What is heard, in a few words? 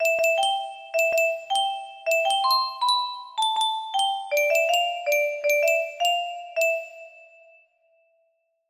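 music box melody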